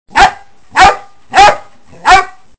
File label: dog bark